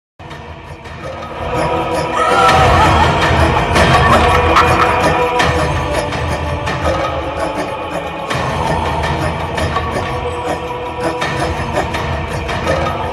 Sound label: villain bgm ringtone; bhoothaddam bhaskar narayana movie